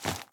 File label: flap; ice age scrats nutty adventure